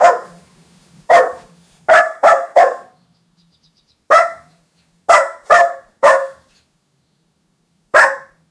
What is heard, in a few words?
bark